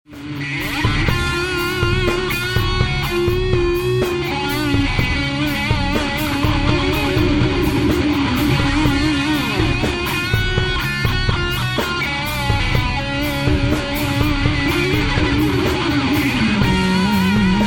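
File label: rock song, guitar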